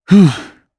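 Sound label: Sigh, Japanese; heroes; Shakmeh; kingsraid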